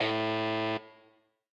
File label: turn finish